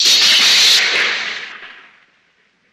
Lightning Bolt Arci

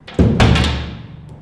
Jail Door Hit; jail & prison doors

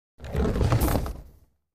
Bowling Ball Return

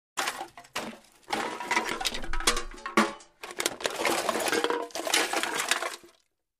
Wood Search Through Garbage or Junk Pile; debris

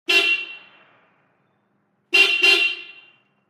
1980 Car Horn Toyota

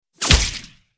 Bullet Whiz Wet Body I

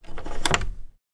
Dead Bolt Lock Quick; lock & keys

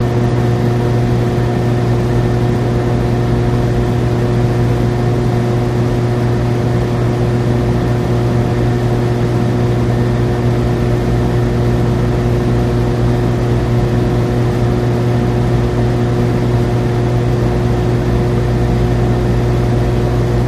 Elect Hum Lots Motor, buzz & hums

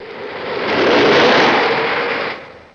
Car Skid Peel Out On Di

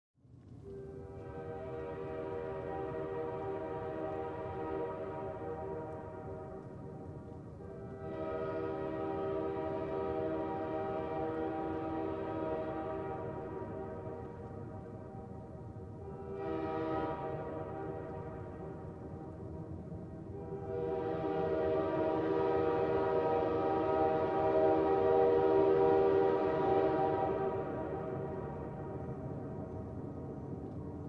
Train Long From Dista, passenger

